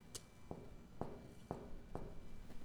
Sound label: hollow knocking